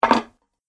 Medium Sized Dinner Plate Placed On Wooden Surface; Dishware Sound Effects; Household Sound Effect